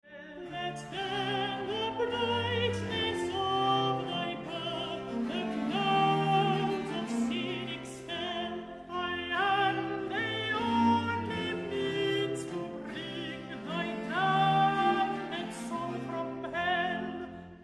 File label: Triumph with pleasant melody